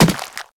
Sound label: Infested Runner Step Default; Warframe